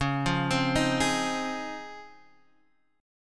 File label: Db Major 9th; arpeggiated; JGuitar